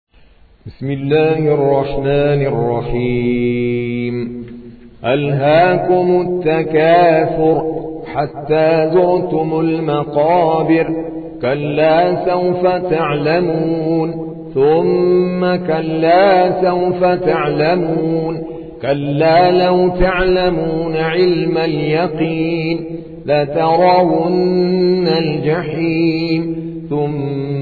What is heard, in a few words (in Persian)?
ترتیل قاری هندی; سوره تکاثر